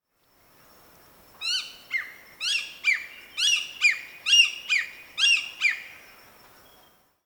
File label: Aviceda Subcristata; Pacific Baza; Call